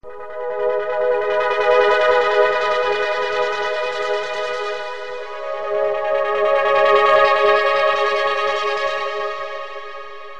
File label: shimmering